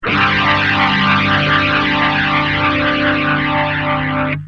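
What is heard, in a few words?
guitar mti ensemble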